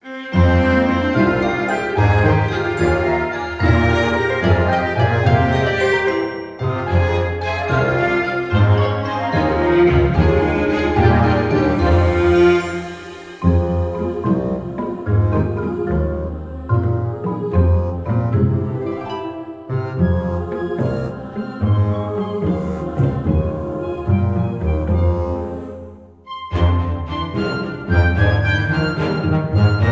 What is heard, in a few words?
Menu Music; Winter's Feast